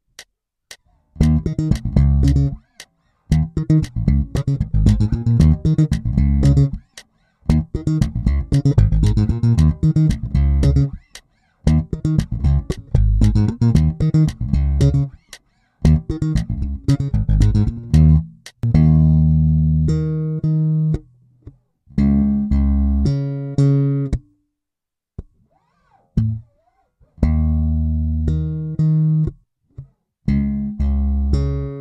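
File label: Doublethumb in Eb, bass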